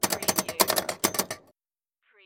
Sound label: Pinball sound, ball hits multiple kickers